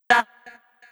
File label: Vox Calling; Novation LaunchPad Mini MK2; ableton; Launchpad demo project 2014